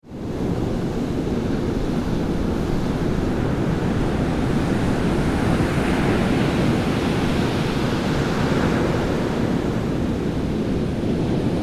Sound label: surf